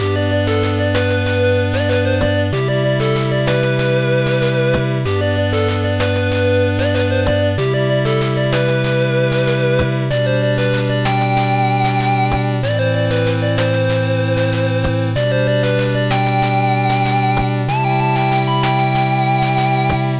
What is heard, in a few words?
8Bit; SM64